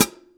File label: HH PD, Drum 1 Dry; East Collexion